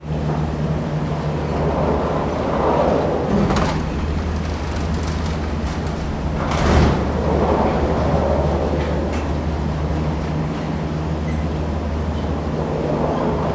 transportation cable cars leave station france luchon superbagneres